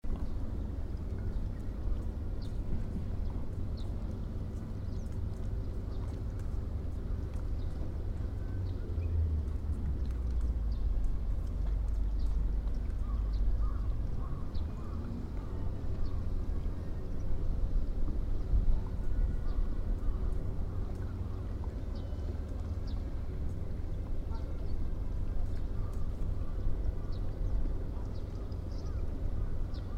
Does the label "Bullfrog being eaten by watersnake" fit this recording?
No